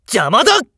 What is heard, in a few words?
attack, voice; jakigan